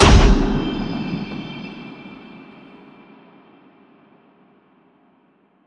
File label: Cloud Lifter; Ghosthack; SkyRealm